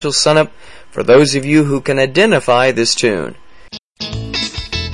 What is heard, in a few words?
Good Old Blues